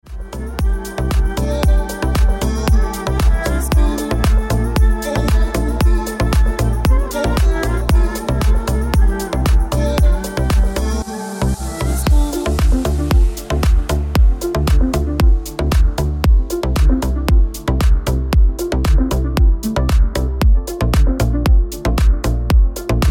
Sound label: Ringtone